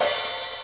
subtle reverb open hat one shot f key, open high-hat sound tuned to the F note